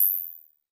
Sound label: interface button, clicks